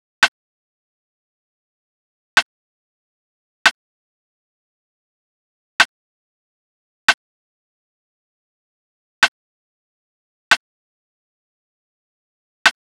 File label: Drum Loop - 140 BPM Rimshot; Loop Stems; Cymatics; Mystery Pack Vol 4; Birmingham